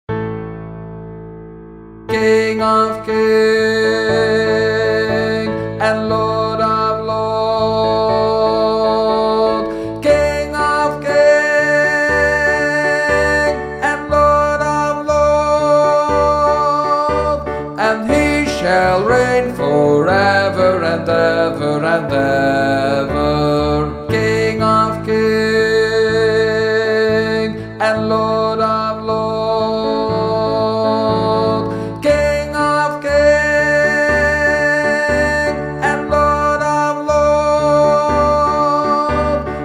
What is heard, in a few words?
Hallelujah bas, gospelkoor